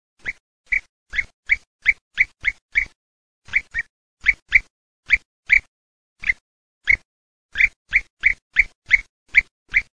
GRUCCIONE, birdsound

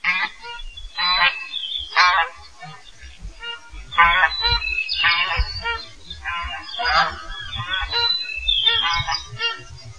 OCA GRANAIOLA oca granaiola

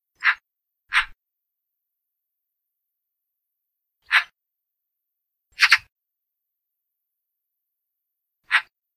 Gazza

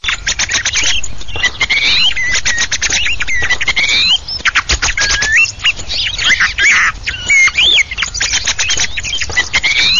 TORDO DOPPIO

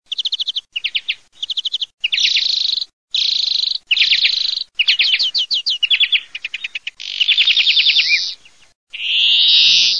VERDONI

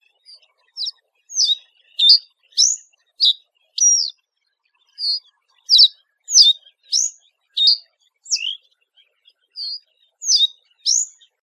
Migliarino di palude

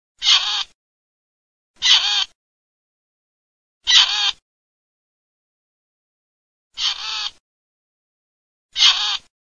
STARNA

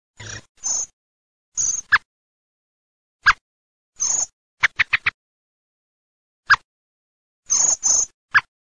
merlo chiocco e striscio

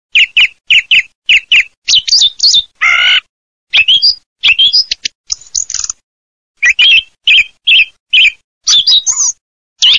TORDO

